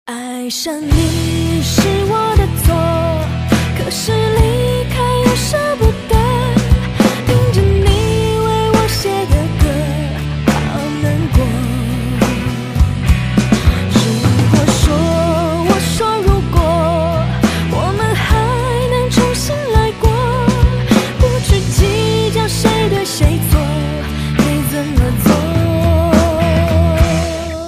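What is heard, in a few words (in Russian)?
китайская музыка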